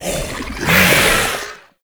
Attack Gap Closer, Vocals; Enemy; Characters; Corrupted Sproutfolk

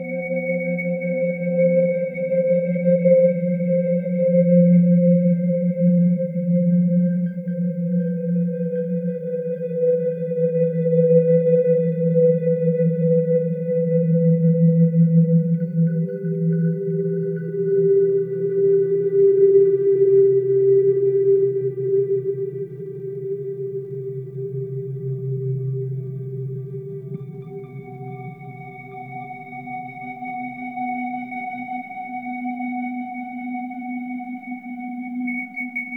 Clan Dojo Obstacle Course Amb; Warframe

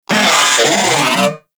Moa C Vocal Ability; Warframe

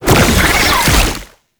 Proto Infested Brachiolyst Hover Start; Warframe; Abilities

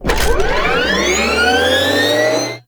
Hoverboard Jump Charge C; Vehicles; Warframe; Venus Hoverboard

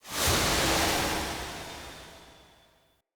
Smithy Steam Burst; Ambience; Soul Plane

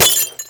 Glass Bullet Impact; Warframe; Ambience; NineteenNinetyNine

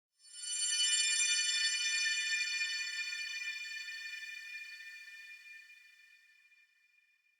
High Baoding; Warframe; Clan Dojo; Dojo Randoms; Ambience

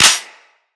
Pistol Mech; Tenno; Cowgirl Pistol

